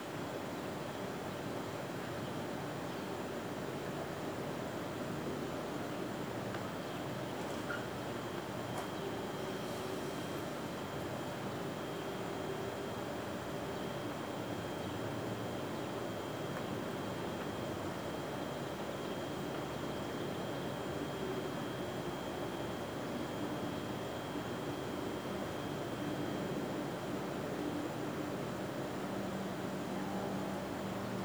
Ambience Lobby; bratz girlz really rock; ps2 wii

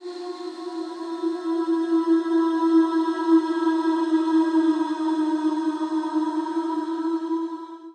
Scary Ambience; Luigi

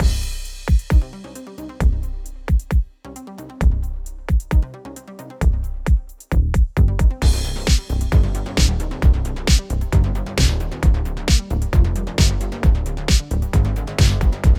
Breakout Doom Mix Stem Layer; Breakout Recharged

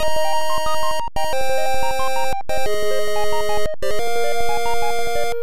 Power Up; Mighty Bomb Jack; NES

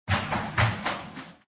Stair Sound; Extra Lives

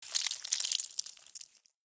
Blood Splash

